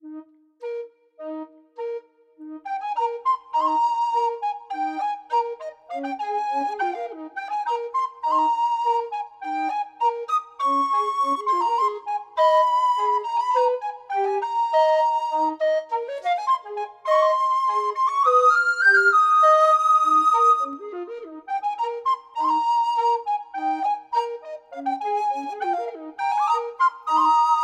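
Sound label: map reveal oneshot 102bpm Eb Major; chicory a colorful tale; macos ps4 ps5 switch windows gamerip 2021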